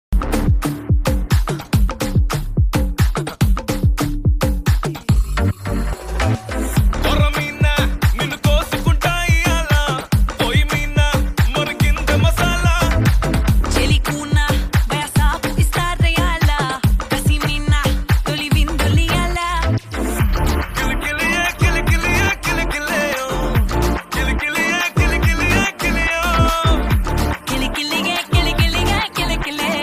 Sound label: song bgm ringtone love bgm ringtone; devara